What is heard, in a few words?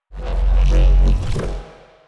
neuro, bass